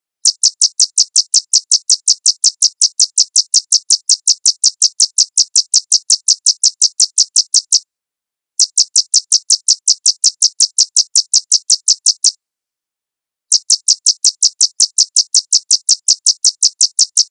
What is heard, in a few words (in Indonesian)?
suara aneh dua